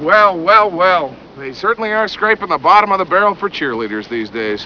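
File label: cheerleader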